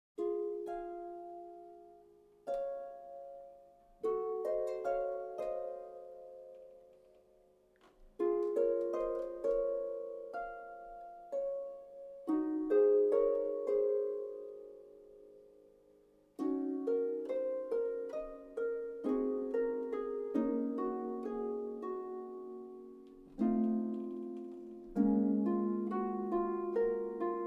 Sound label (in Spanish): Arpa